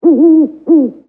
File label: owl; modern warfare 2; amb elements